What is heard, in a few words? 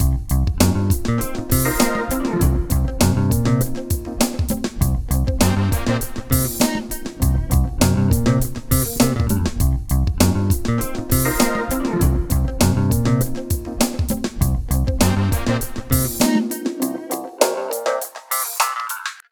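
COOL JAZZ, Bass Grooves; USB Soundscan vol.30; AKAI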